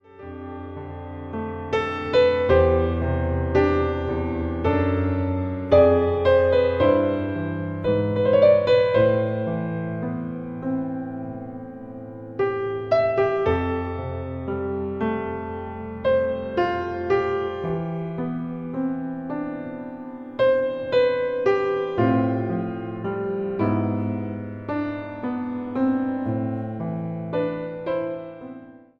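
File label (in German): Choräle am Piano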